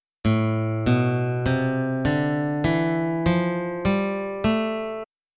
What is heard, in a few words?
natural note intervals